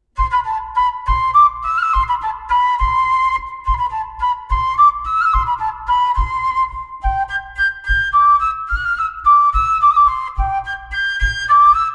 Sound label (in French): Bourree; Villebichot